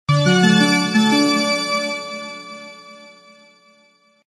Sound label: You Win; 7 Sexy Sins; android